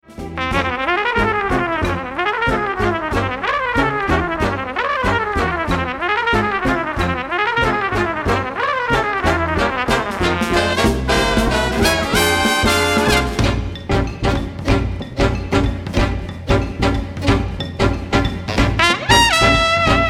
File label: CONCERTO FOR TRUMPET